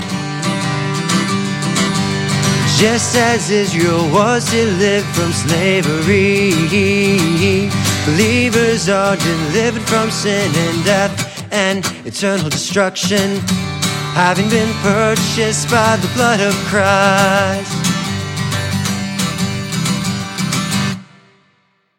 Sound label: Music Only; Creed 16; Redemption